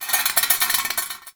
Metal Rattle; Props; Warframe; Tau; Ambience

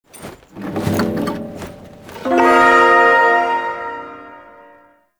Ersatz Shawzin Equip; Music; Warframe; Duviri

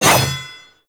Sword Whoosh; Warframe; Teshin; Weapons

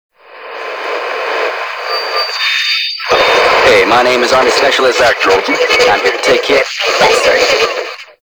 Interior Stadium Radio Interference; Nineteen Ninety Nine

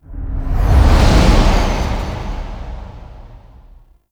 Transition One; Deadlock Protocol; Cinematics

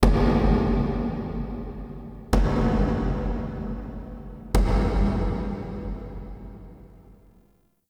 Knocks Large; Warframe; Ambience; Entrati

